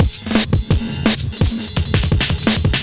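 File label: Drum and Bass Beat 170, drumnbass 170bpm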